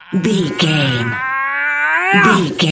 voice male effort push slow

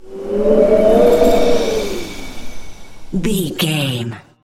wind blizzard storm spell light

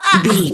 voice female hurt pain choke

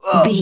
voice male grunt pain

